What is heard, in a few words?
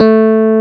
PUNCHREEDS; Wizoo - Powered DX